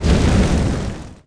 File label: monster action grab the sand